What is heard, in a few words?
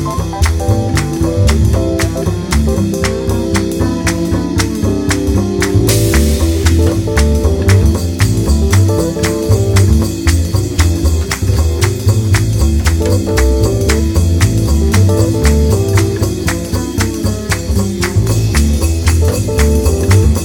Instrumental